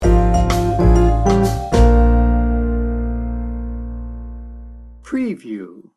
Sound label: mellow jazz sting bumper